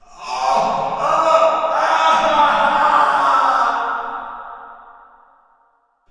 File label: prison pain scream; Quake 4 Alpha; id software